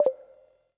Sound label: deck ui message toast; steam; steamui